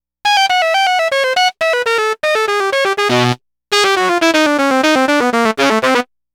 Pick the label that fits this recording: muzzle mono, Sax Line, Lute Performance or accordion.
Sax Line